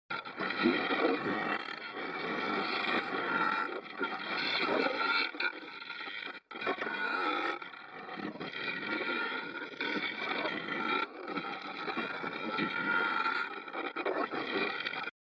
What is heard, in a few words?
viewers swarm; soda